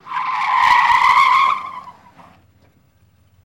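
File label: Cadillac Skid To Stop; Cadillac 1972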